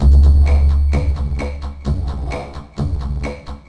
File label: Octave Down Loop 130BPM, DrumLoop Ghost Layers